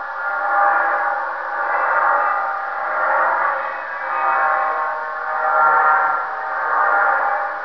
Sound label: Generator; hl2